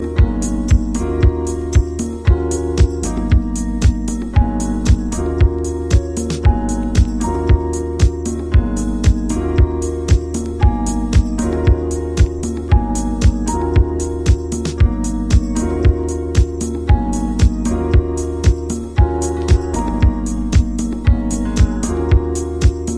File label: DOWNBEAT